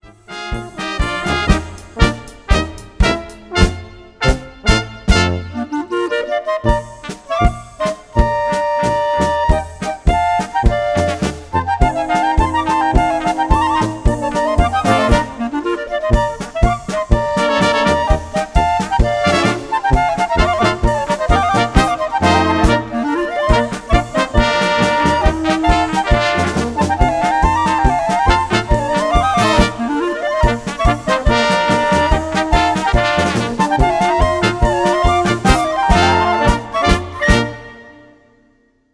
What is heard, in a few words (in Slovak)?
Dychová hudba; schnelle